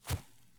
run grass low; Escape from Tarkov

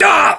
pain, english

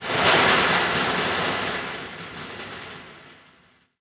Amb Constructions; Red Orchestra Ostfront 41-45

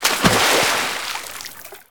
sewer splash; Modern Warfare

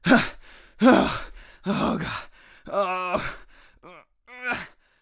agony; Escape from Tarkov; usec2

